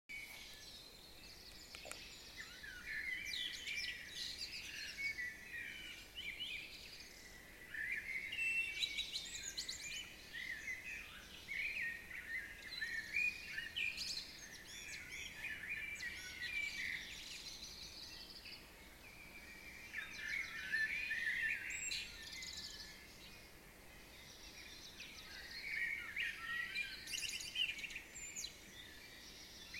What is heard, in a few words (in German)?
Vogelgezwitscher